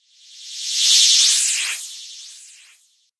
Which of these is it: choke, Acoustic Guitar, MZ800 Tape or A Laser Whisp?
A Laser Whisp